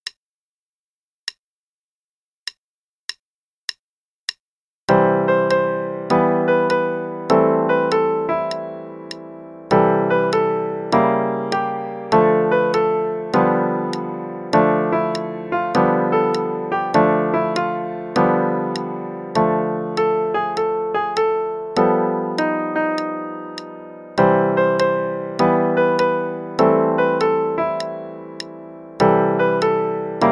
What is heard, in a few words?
Play-along (simple, qn=100); Piano; Christmas